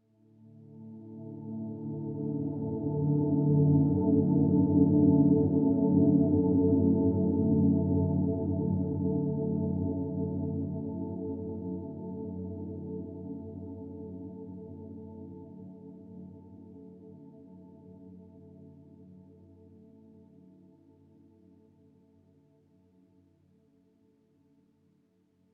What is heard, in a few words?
Swell Tone; HDD Theme Scull Swell Tone 04d; inside